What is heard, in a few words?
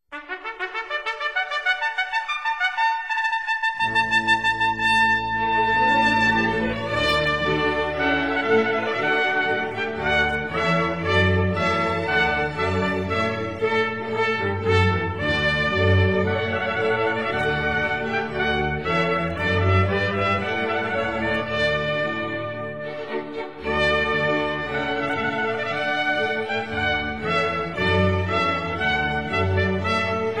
Trumpet Voluntary; Purcell